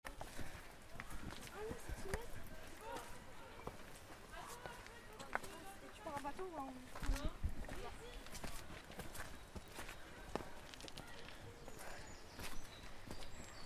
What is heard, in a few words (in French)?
ambiance marche sentier voix; college vallee du thore